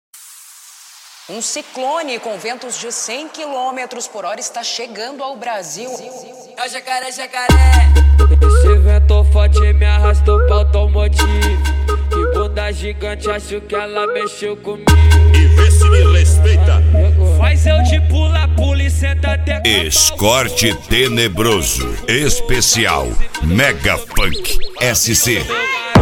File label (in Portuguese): mega funk